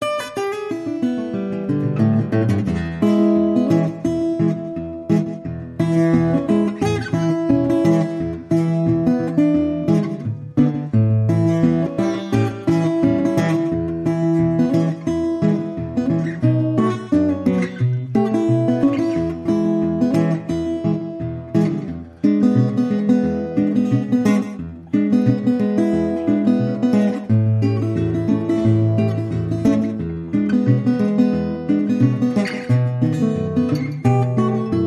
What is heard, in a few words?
Bluesy Finger Pickin